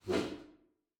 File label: Whoosh Reverb